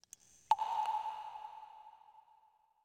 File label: water drip; Rust